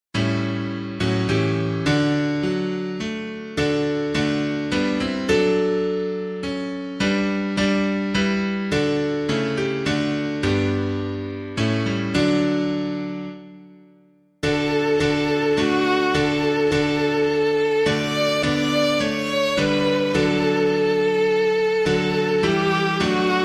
piano

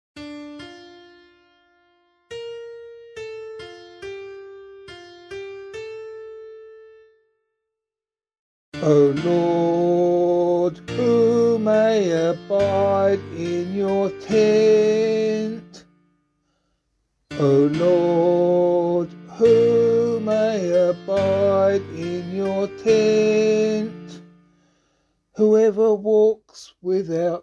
Psalm C vocal

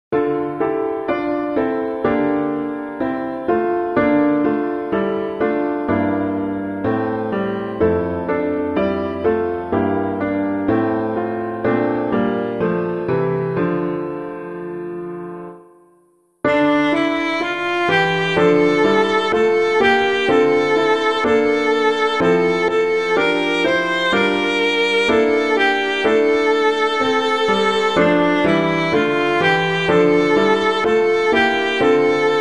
piano, hymn; Come Behold the Bread of Angels; Hibbard; Corpus Christi

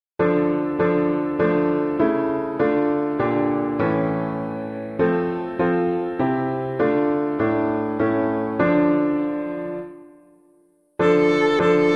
piano; From the Father's Throne on High; Dudley-Smith; HEINLEIN

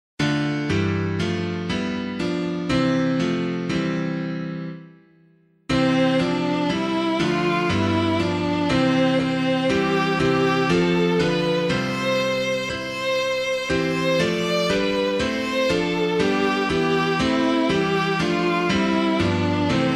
piano, hymn; Dunstan

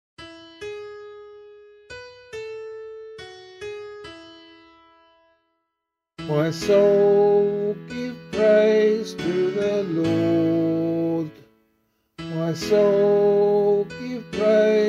Psalm C vocal

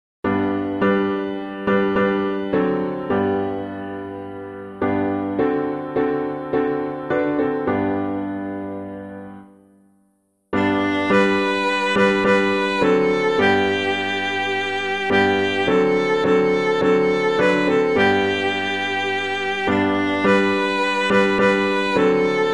piano; BEALOTH

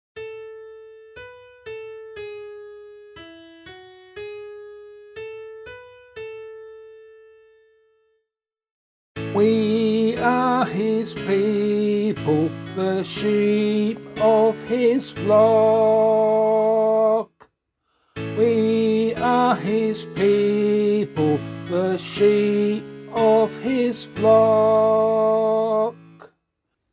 Psalm vocal

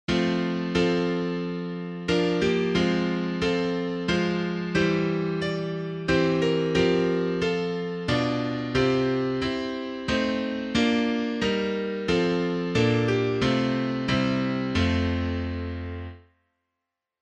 O Trinity of Blessed Light, piano

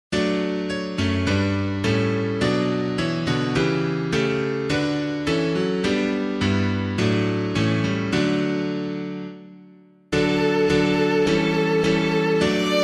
Christ Our Lord the Prince of Ages, piano; LAUDA ANIMA

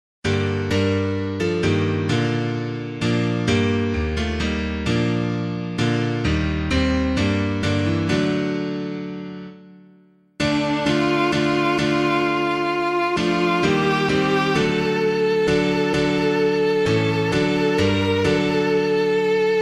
When Jesus Comes to Jordan's Stream, piano

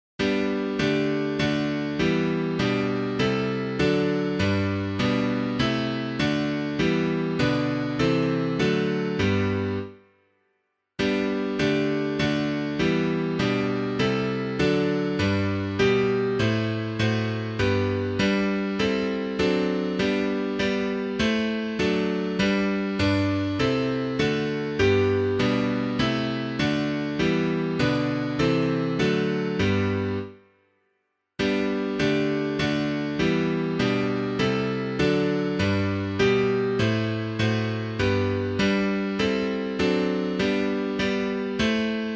O Trinity of Blessed Light, piano

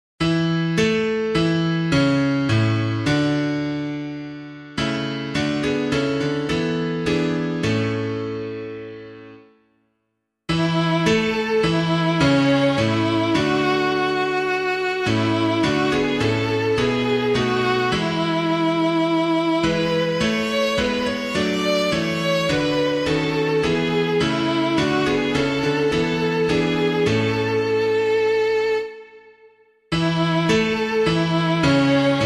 piano; Arise Your Light Has Come; Duck; FESTAL SONG

